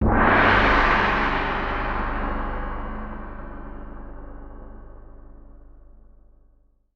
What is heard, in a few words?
Gong